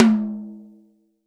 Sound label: tom, Real Drum Kit, funk kit